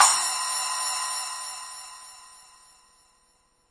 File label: rs7000 hh cy eb7, Yamaha RS7000 Cymbals; drum machines